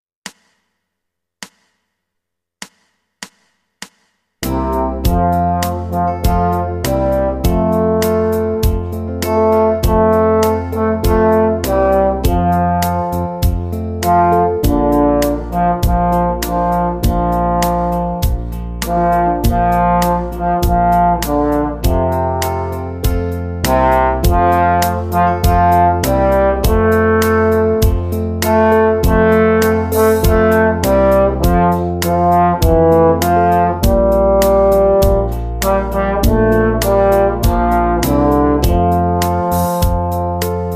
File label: Worried Man Blues